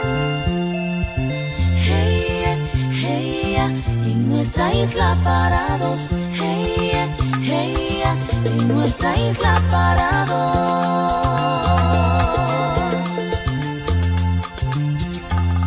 island latin ringtone

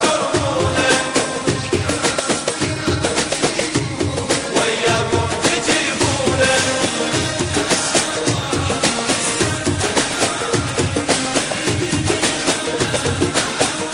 old iraqi song ringtone

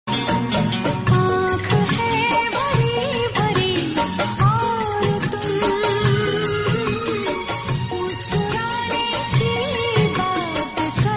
female, ringtone

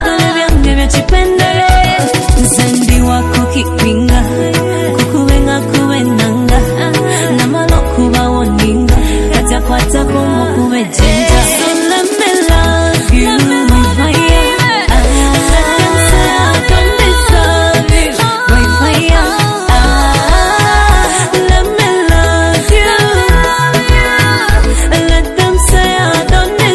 ugandan new music ringtone

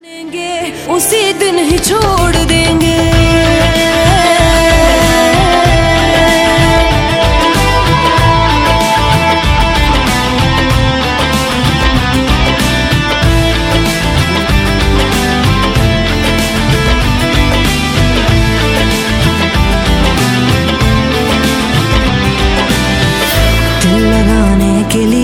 hindi ringtone

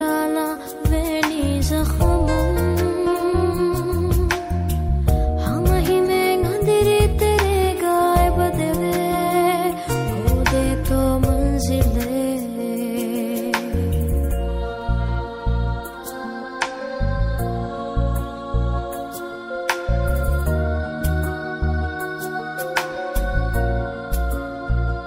dhivehi ringtone

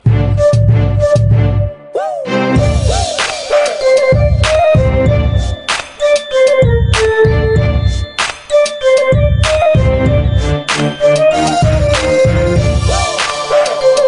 hip hop arabic ringtone

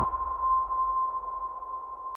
sms tune, ringtone; avengers infinity war

